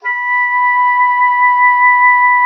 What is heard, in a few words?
B5, OB D.AMORE; Xsample VOL-7